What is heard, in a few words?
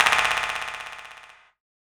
CLAP FX